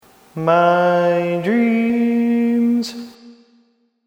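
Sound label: baritone, barbershop tag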